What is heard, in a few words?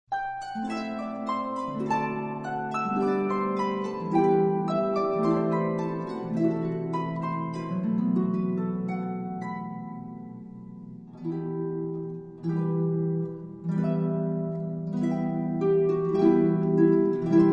hymn, harp